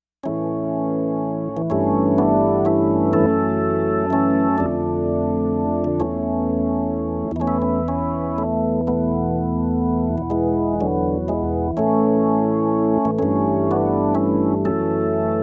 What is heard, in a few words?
rnb organ; RnB Organ 1; JUNO-Di Patch Demo